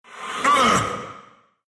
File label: pain, ru; dota2